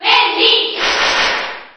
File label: Cheer JP; Wendy Koopa; SSB4